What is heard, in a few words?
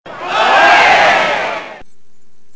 ola, tono di notifica oleee